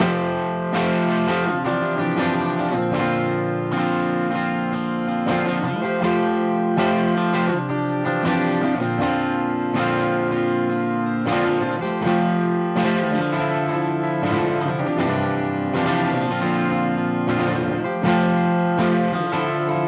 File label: Clean